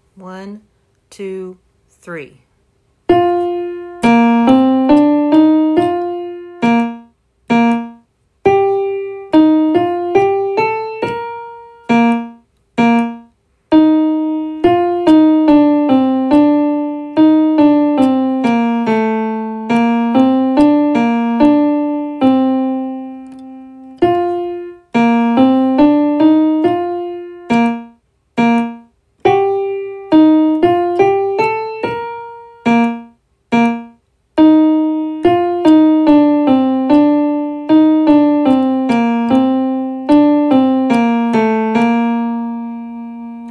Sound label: winds